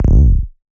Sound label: Slide D#, Bass